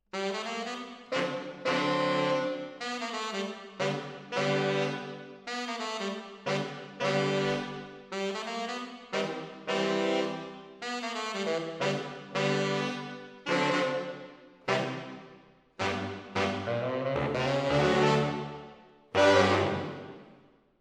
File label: MKH AB, piano